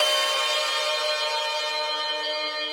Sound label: synth main